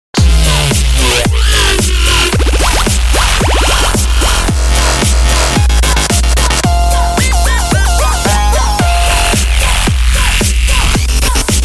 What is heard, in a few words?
dubstep ringtone